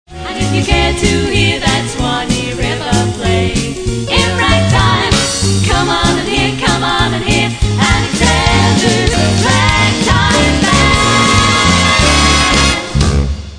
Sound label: ragtime